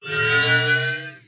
pickup; god-hand

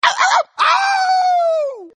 win; casey

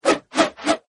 attack; kudabot

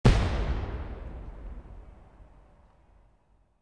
cannon; Lux Ahoy

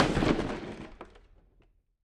hit; Lux Ahoy

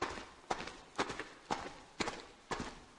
footsteps ghoul; Be Cool Scooby-Doo! - It's Dark Out There; ThrillGames

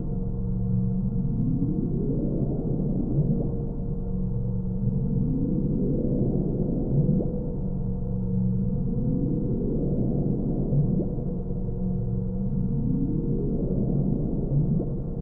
ambient popup loop; Adventure Time - Angry Betty; ThrillGames

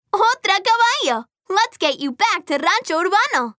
horse; Dora and Friends - Legend of the Lost Horses

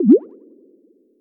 bubble; Nickelodeon - Capture The Slime

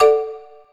button press; Dora and Friends - Dora's Wonderful Wardrobe!

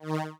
button over; Wacky Races - Sling Races; NextlevelGames

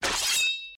draw; Ben 10 - Tomb Of Doom

